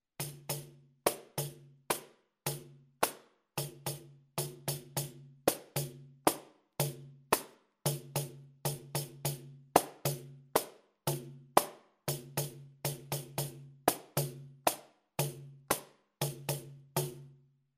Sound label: partido alto variacao slow, pandeiro